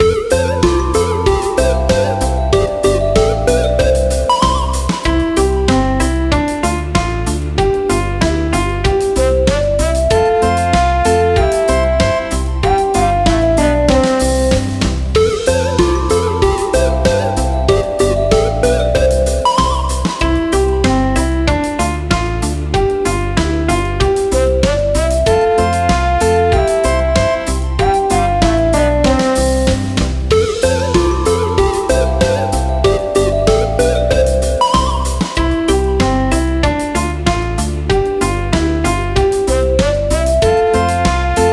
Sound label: karaoke